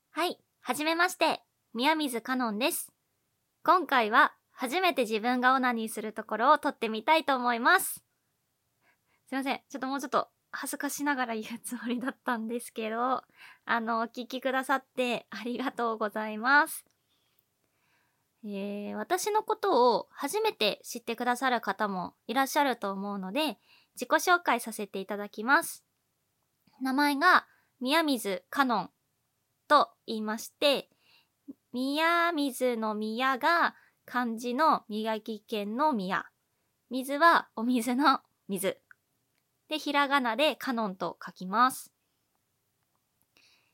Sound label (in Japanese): 初 ガチ 実演 オナニー; THE FIRST ORGASM